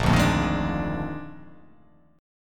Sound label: A sharp 11th